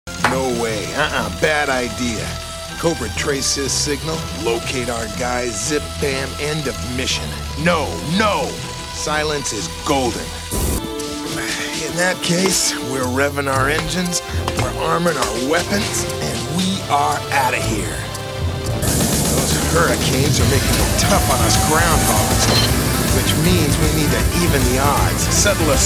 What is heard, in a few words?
sci-fi